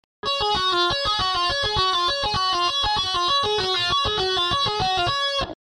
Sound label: tapping